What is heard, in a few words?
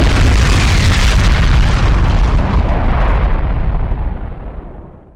explosion; Cosmic Rage; blackdwarf